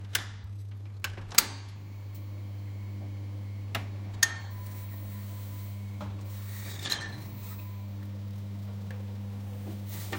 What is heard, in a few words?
turning on old lamps in, store